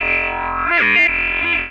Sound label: TECHNO EXTRA 140